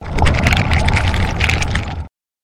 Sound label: monster water bugs